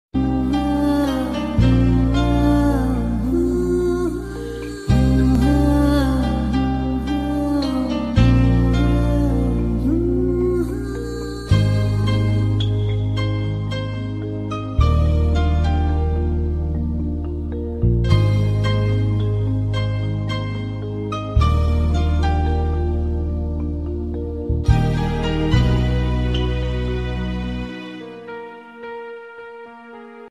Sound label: love bgm ringtone; hearttouching love bgm ringtone; hey ram; kamalhaasan bgm